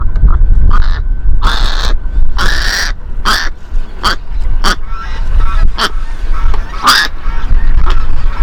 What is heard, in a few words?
white lipped peccary juv begging